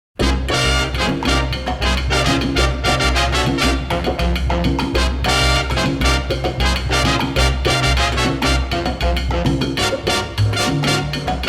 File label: Mambo Jazz Instrumental